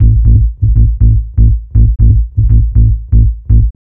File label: Synth Bass; Basses